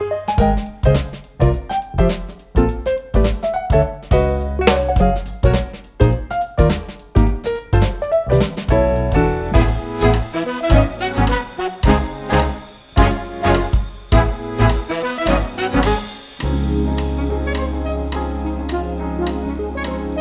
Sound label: Ringtone, Samsung